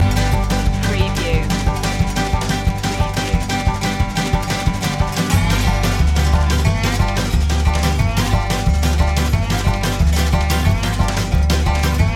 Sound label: hickabilly hoedown loop